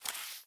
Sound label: Paper Move; Game rip; MiSide